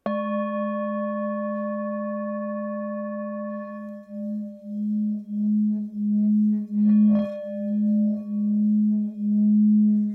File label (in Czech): tibetska misa; dawa stredni